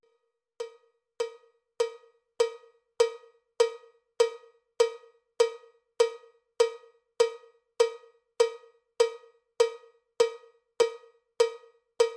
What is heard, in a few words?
cowbell click track bpm 79; cowbell click track bpm 100